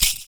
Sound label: FOOT PERC; Univers Sons - Raricussions